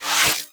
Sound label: Electricity Shock Burst Fire Stop; Warframe; Corpus; Oxium Osprey